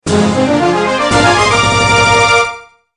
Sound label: Heart Container Fanfare; Zelda